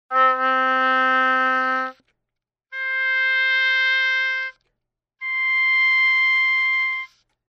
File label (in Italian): Ottave